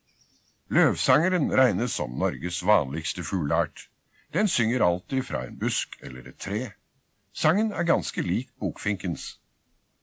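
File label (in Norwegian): løvsanger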